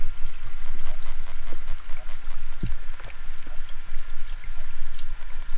chattering, fish grunts and sonar

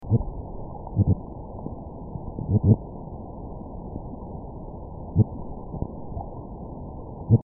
Serrasalmus sanchezi, Amazon, soniferous

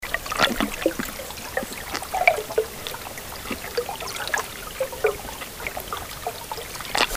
Hypostomus carinatus cf; Amazon

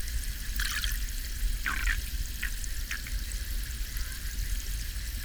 Oncorhynchus mykiss, rainbow trout; soniferous

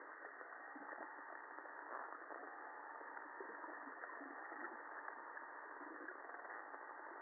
alarm like

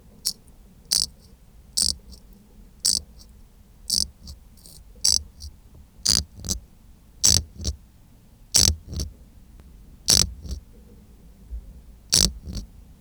Sphoeroides maculatus; Carolina fishes

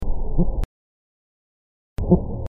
Serrasalmus eigenmanni cf; Amazon

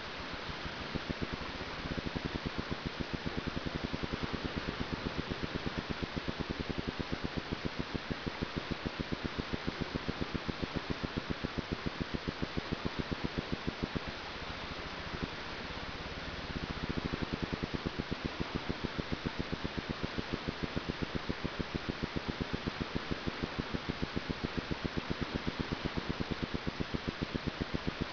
Melanogrammus aeglefinus haddock; soniferous

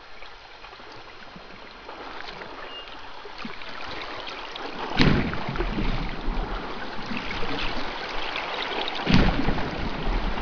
wake, river project sounds; wakesounds

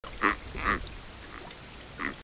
Rasping Sailfin Catfish; soniferous

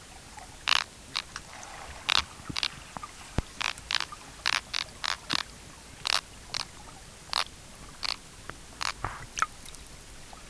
Platydoras armatulus; Amazon

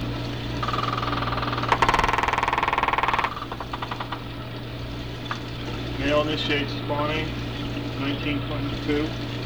cuskeel; soniferous

